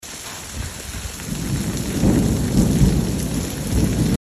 打雷下雨声

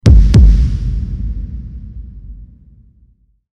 紧张咚咚声

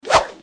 丢东西

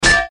敲门

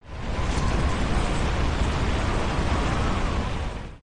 龙卷风产生; 武器音效

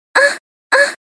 女啊声 连续

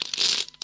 麻将发牌

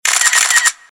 拍照-多个

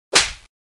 扇耳光

